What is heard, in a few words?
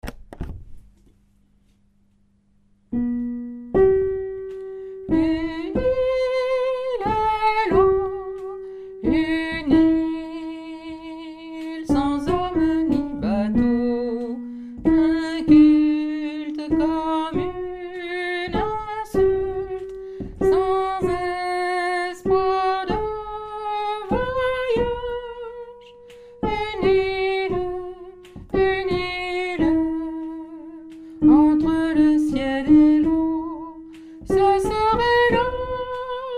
soprano